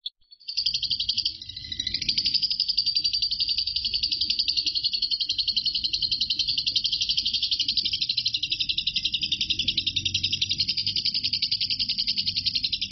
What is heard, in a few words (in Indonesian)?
suara burung lovebird ngekek; Marco